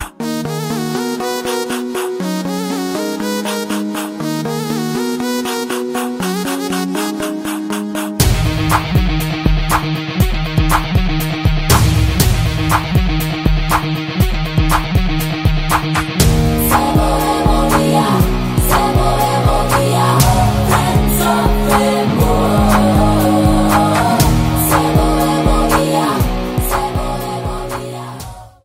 Instrumental Ringtone; Greekuveerudu

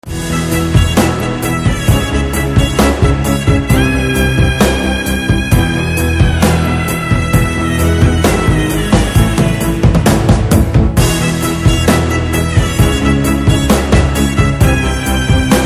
BGM Instrumental Ringtone

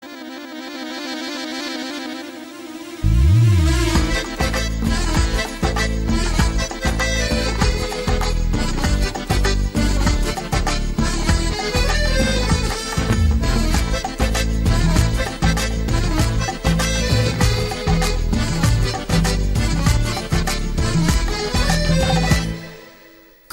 Instrumental Ringtone; Murari

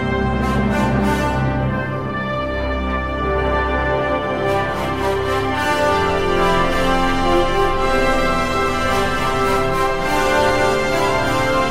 Music Ringtone; krypton

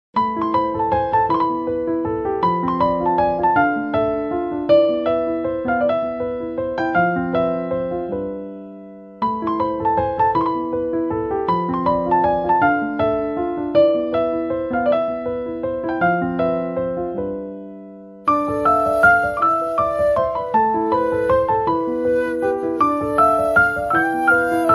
BGM Piano Ringtone; Maestro

